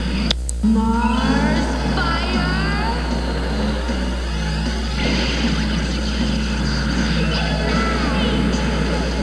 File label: ignite